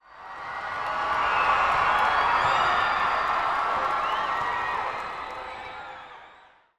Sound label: crowd Reaction arena small Reveal; spellbound